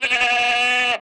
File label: Goat